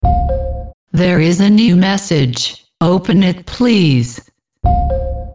Open Message

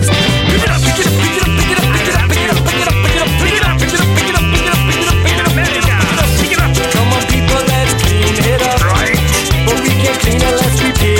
ska

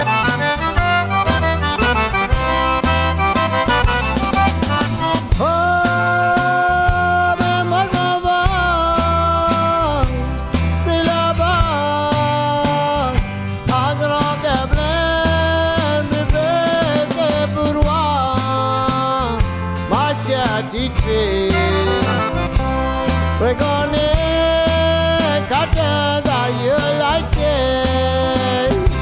Waltz

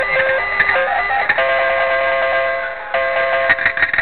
Slot machine

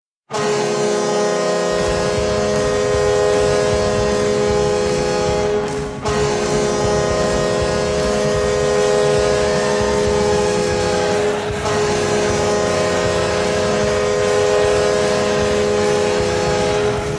Blackhawks goalhorn

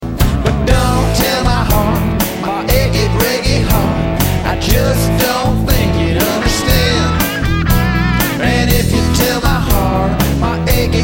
Achy Rock

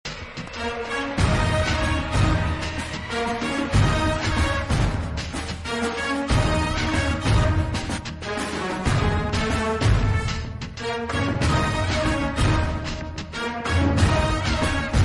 Bgm; Vettai

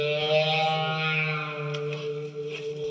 Riff; TMNT

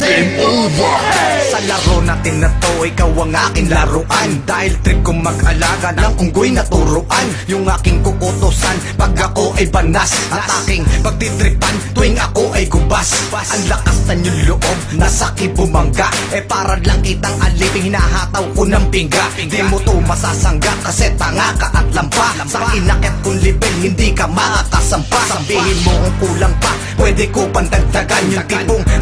Game Over

